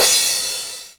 Open Hi Hat Sample F# Key, Royality free open hi hat one shot tuned to the F# note